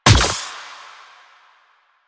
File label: grenade bounce; Warframe; Lasrian; Lasrian Soldiers; Weapons; Lasrian Goonadier Grenade